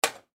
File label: Venetian Blinds Lever Impact Click, Household; Windows; Blastwave